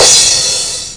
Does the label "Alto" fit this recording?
No